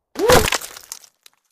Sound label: Bullet Impacts Wood I